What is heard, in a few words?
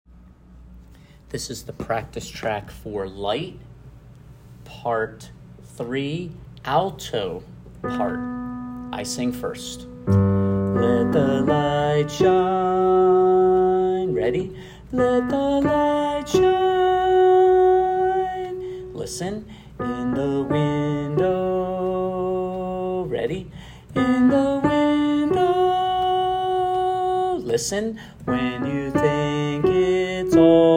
Light Practice Part 3 Alto; 4th Grade Chorus; Practice Track Part 3, 5 and 6 Alto